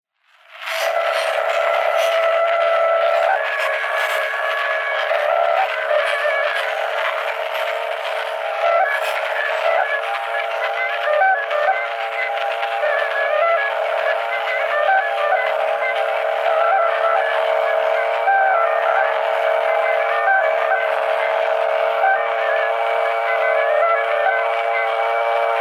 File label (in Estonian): Torupilli Jussi lugu, Emmaste, torupill, folk; Juhan Maaker